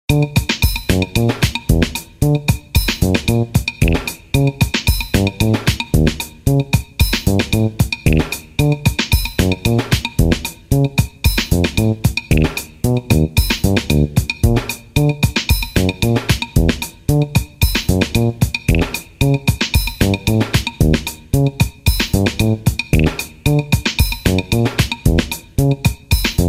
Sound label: Question Music